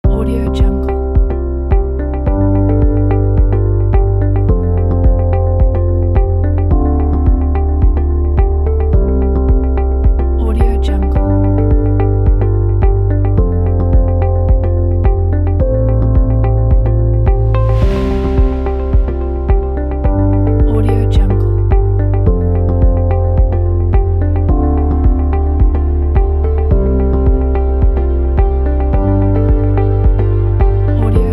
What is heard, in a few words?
soft corporate background